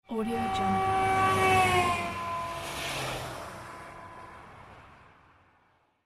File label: car pass horning